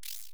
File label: Spider Buddy Jump